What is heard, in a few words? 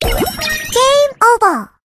bubble gameover; game rip; line bubble